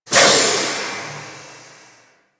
Settlement Valve Open; Ambience; Warframe; Grineer Settlement

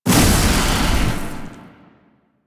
Survival Orb Destroy; Warframe; Zariman

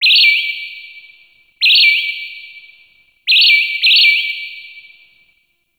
Terrarium Bird Chirp C; Warframe; Ambience; Entrati; IntTerrarium

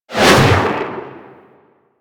Melee Slam Generic Whoosh; Warframe

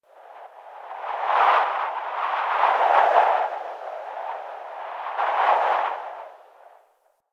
Wind Gust, Ambience Plains

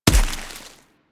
Snow Ball Impact; Warframe

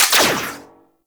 Rifle Mech; Grn Kuva Lich Rifle

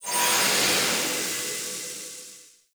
Portal Enter Stinger; Drifter

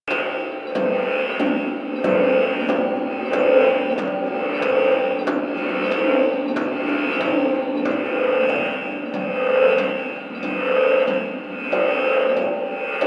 Rail Jack Ambient Machine Loop; Warframe

